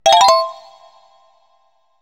Collect Banana Token; donkey kong 64

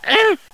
Ouch Squeak; garfield kart

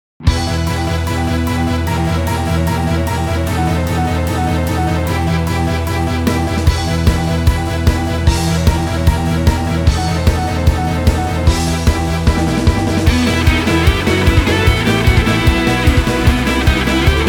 Warning; Paradise Ocean

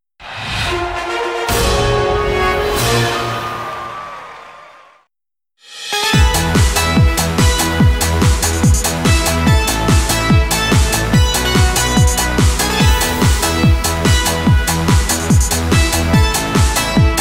Karaoke ~ Results; yakuza 6 b sides ps4 windows xbox one gamerip 2016

